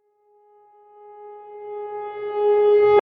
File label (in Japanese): ピアノ逆再生 単発